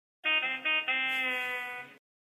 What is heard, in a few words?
saxophone